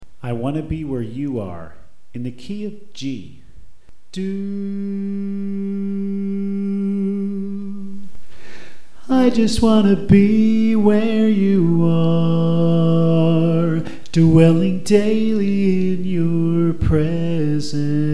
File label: Soprano